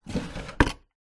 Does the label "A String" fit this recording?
No